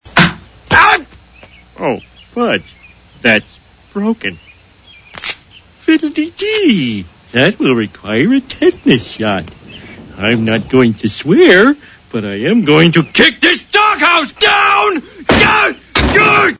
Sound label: fiddle; Simpsons; Homer